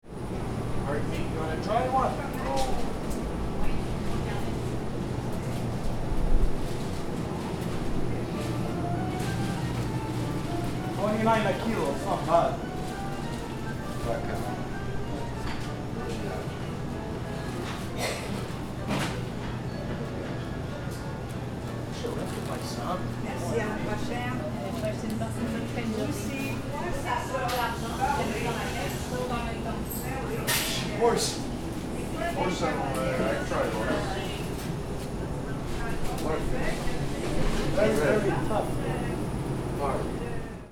Shopping center ambience chain grocery store

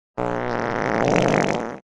Long wet squelchy fart

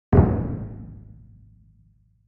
Gran cassa single

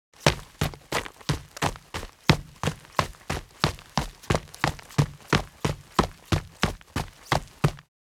Footsteps running on dirt jogging steady

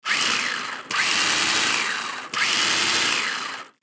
Remote controlled sport car motor revving; rc toys

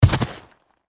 Footsteps on snow boots single step

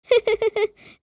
Little boy laughing

